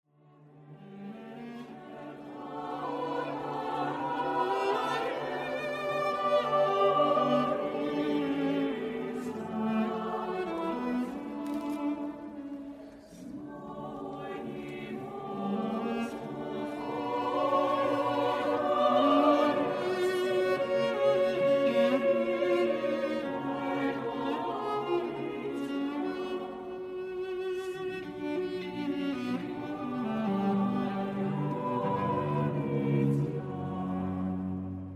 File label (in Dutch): vocale kwartetten voor cello en koor; A. Arenski